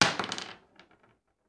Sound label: Bullet Shell; Sound Ideas; Guns